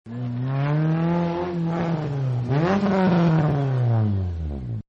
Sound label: Rally Sport Comes Back, Cars; Vehicles; Partners In Rhyme